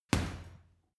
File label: boxing punching bag uppercut hard